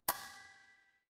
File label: ui click